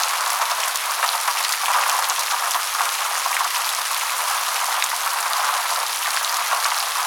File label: Shipyards Rain Splatty Surface; Exterior; Warframe; Grineer Shipyards